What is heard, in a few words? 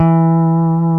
PEDAL STEEL